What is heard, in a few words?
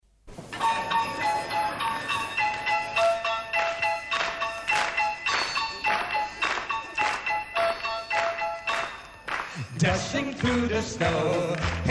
Jingle bells